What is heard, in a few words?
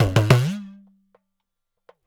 Idakka